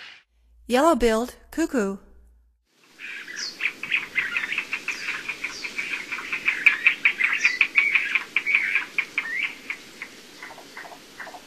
Yellow-billed Cuckoo, Songs of Birds of North America; Stokes